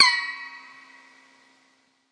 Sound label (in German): Eierschneider; Weird and Interesting Hits; single hits